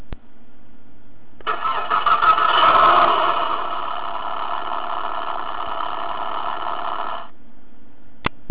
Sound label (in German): starten; Motorstart; Soundlenkrad; Astra XS; BobbyCar; 20er Soundlenkrad; Pimp my BobbyCar